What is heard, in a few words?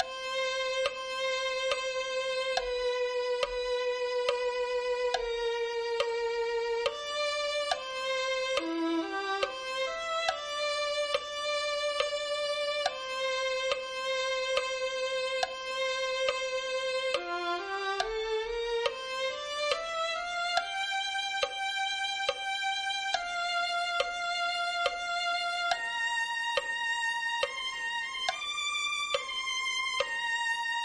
violin 1